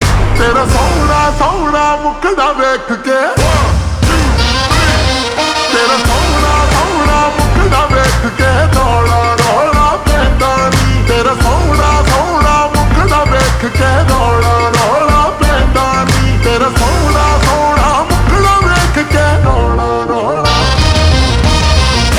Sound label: Ringtone slowed and reverb